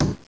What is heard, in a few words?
stone Menu; wob2 demo